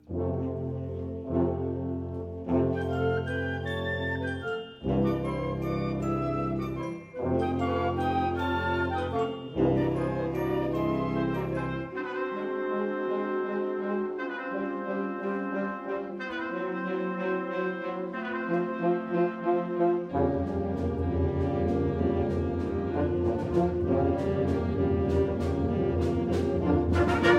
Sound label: Brass Broadway; Brass on Broadway